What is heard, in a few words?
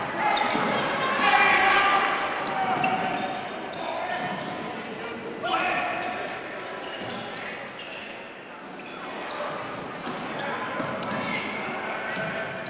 gymnasium ambient